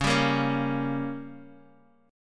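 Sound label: G Diminished, D flat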